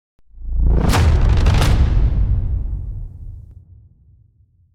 Whoosh Hit

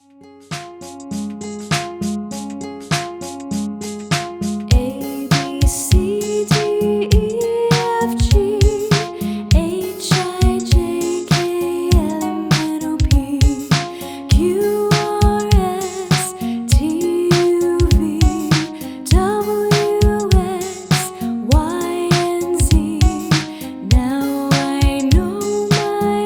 ABC SONG; learning english

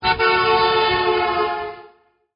Car beep pass

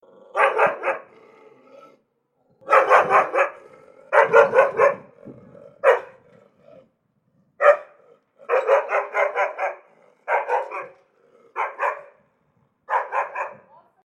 wild dog sound; angry dog; animal

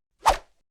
change slide sfx, transition

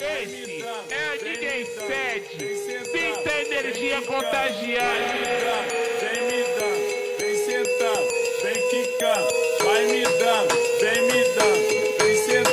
Funk, SLOWED

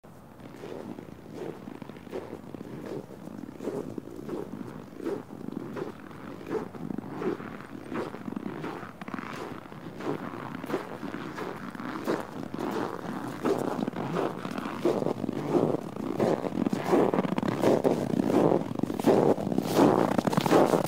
Normal Approaching Footsteps on Creaking Dry Snow; human

